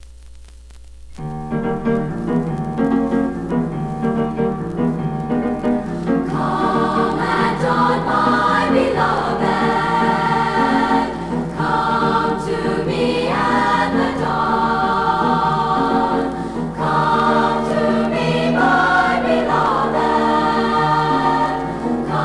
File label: Three Spanish Ballads, chorus